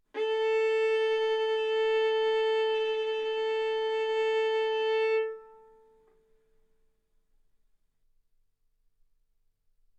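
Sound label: Violin arco mf A string A4 B4 stereo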